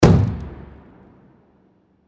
Hockey Puck Impact, Hockey Puck Slam Into Boards Glass Impact; Blastwave